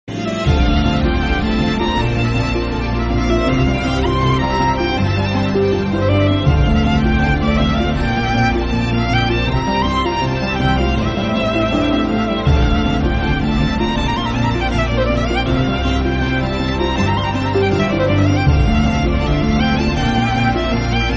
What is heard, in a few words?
Song Ringtone